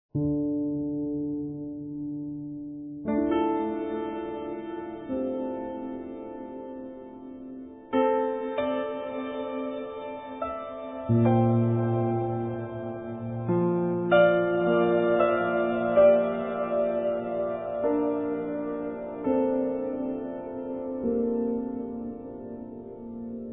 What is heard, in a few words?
piano textures